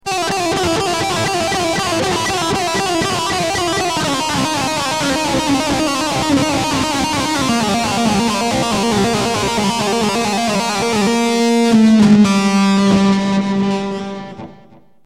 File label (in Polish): potrojny pull off, tremolo